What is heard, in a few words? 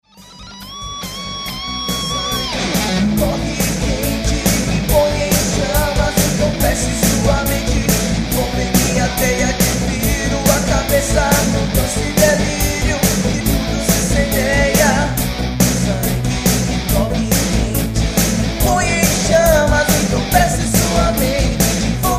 pulsante guitar